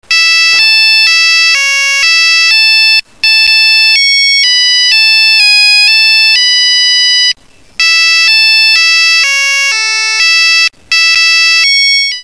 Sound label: siemens, Polyfonisk musik, Hymnen; midi; australien